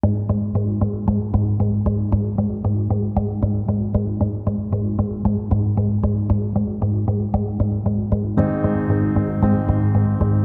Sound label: haunted piano